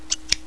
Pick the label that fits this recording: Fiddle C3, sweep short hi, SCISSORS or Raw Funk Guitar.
SCISSORS